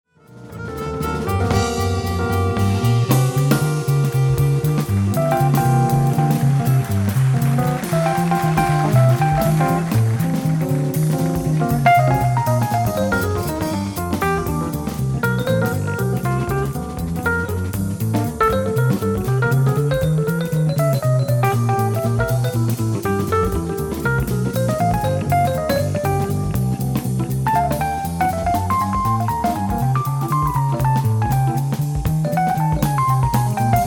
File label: Tenor